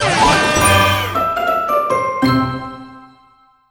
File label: Crunchy Finish